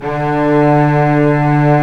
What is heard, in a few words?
STR SWVC D0C, Roland L-CD702, STR Vcs4 mf